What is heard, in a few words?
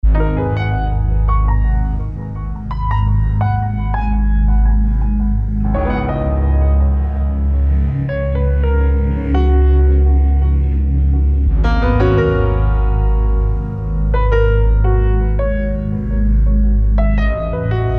Film Music Mix Loud